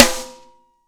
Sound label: SNARES DRY; Real Mega Drums